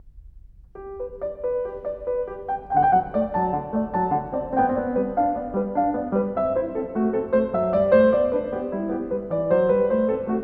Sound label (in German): Einstimmige Inventionen G-Dur, klavier; Inventions; BWV 781